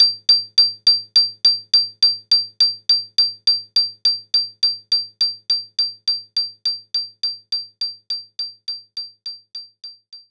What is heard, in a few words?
Organ Perc A4